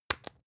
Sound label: spice jar smack open hard; industrie wirtschaft; gastronomie